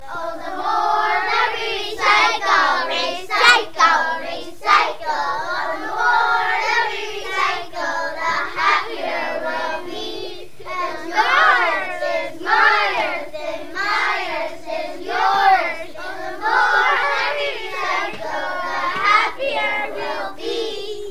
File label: recycling song